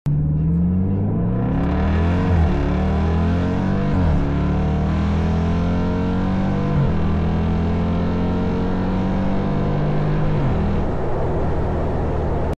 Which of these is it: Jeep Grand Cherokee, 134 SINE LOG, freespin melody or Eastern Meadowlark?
Jeep Grand Cherokee